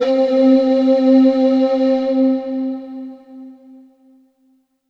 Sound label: Korg M3R Vox Land C4